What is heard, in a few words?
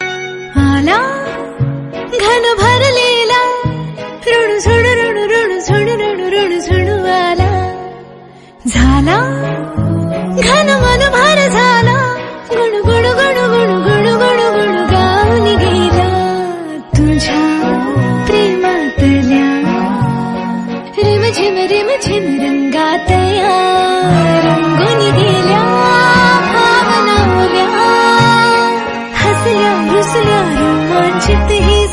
Marathi Ringtone